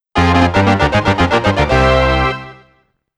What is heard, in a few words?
Jingle; Tetris The Grand Master